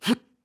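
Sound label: Lift, voice; BOTW; Breath of the Wild; Link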